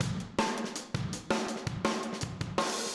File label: Room, Drums, 162 BPM; Verse; Drums from the Big Room The Mixes; Take Your Toms Off